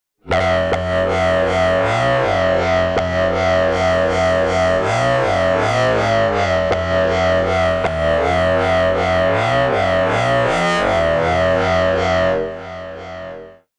Synth Mono

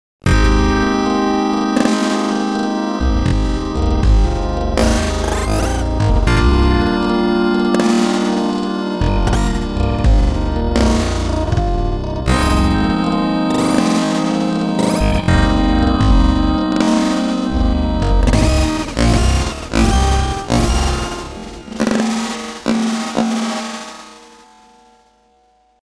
Pop

